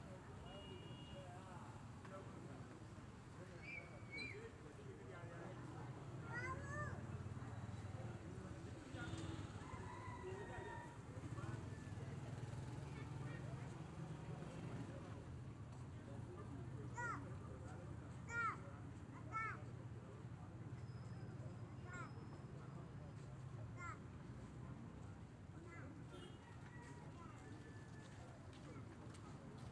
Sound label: African Village Street Ambience Daytime Stereo Binaural